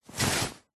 foley clothing high vis jacket thick hand into pocket; zapsplat